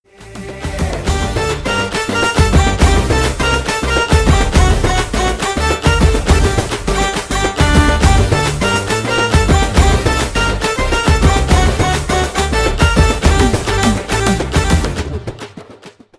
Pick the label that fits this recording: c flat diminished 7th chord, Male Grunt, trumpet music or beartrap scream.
trumpet music